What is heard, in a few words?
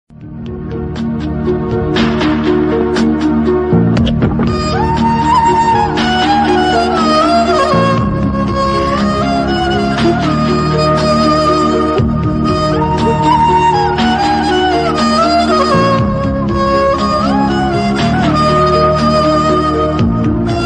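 sad violin bgm telugu love bgm ringtones; pspk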